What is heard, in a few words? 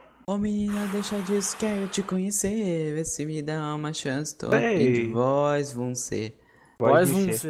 singing